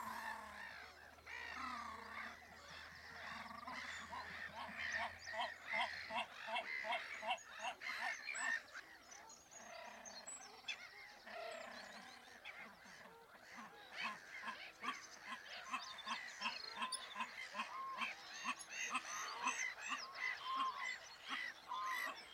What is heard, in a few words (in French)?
Grebe huppe Podiceps cristatus